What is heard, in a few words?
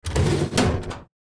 Small metal drawer open; household